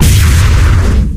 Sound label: explosion charge; cow mangler; tf